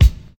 high quality mid heavy bass drum sound C key, kickdrum tuned to the C note